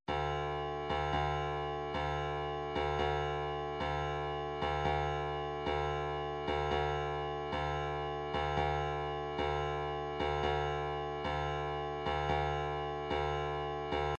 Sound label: 129 snare, midi